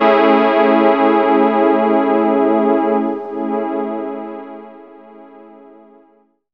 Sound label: DR. LOOP, TRANCE; X-Static Goldmine 3